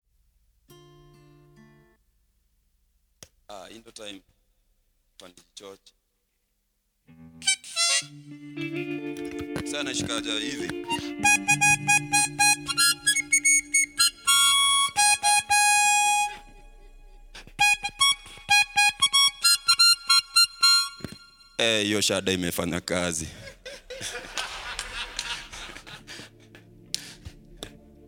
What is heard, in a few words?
Harmonica Intro; Kenya